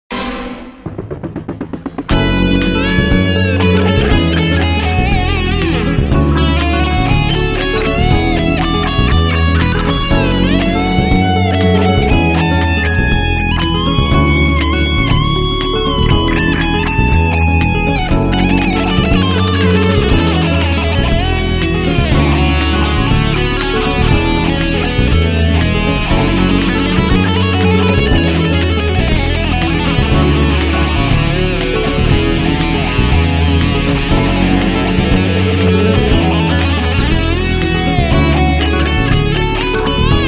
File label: Monday Acoustic